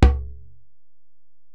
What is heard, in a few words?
Ac Perc, Drum Machines Alesis DM-PRO